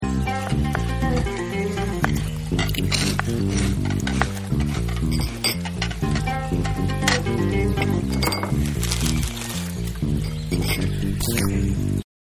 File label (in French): table assiette verre cuisine legume repas manger chips son musique ambiance; peche aleau